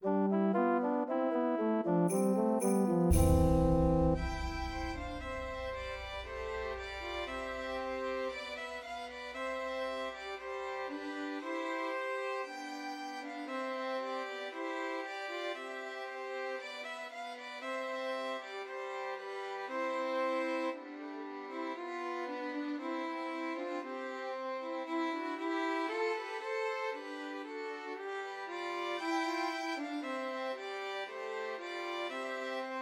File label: Trios for Three Violins; Deck the Halls; Christmas